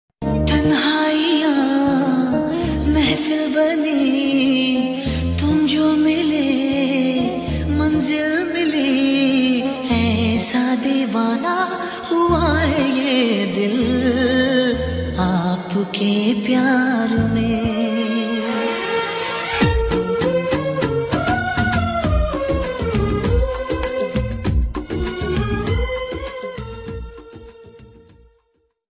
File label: Ringtone